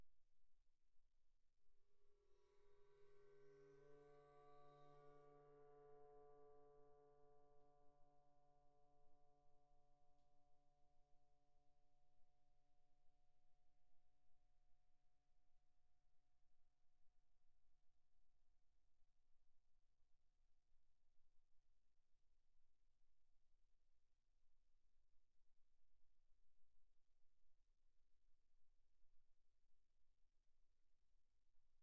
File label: 22 tamtam, bow; Percussion; gongs & tamtams